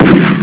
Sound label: bang